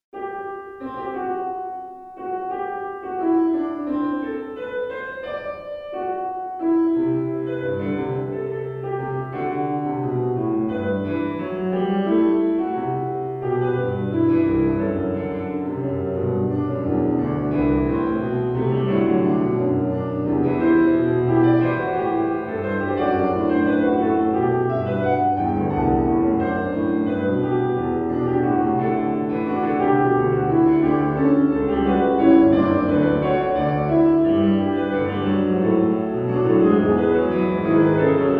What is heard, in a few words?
fuge